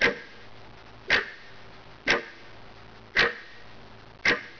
CLOCKTIC

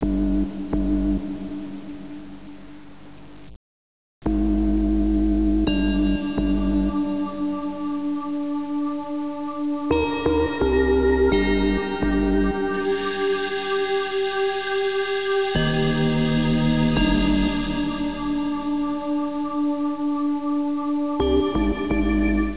PAD

